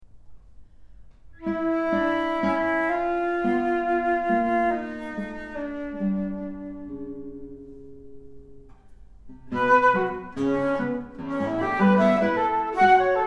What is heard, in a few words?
Flute and Guitar